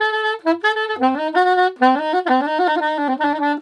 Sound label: SAX, Balkanish Traditions